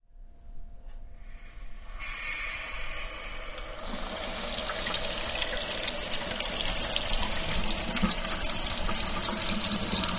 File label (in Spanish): drenaje